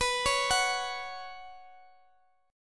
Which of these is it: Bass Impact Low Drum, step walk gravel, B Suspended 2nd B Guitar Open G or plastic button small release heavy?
B Suspended 2nd B Guitar Open G